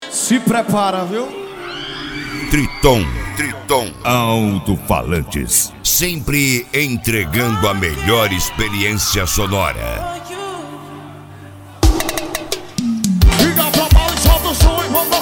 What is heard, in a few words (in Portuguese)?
alto falantes especial pisadinha; Triton Pisadinha